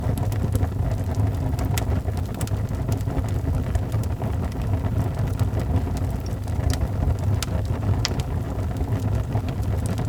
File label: close fire looping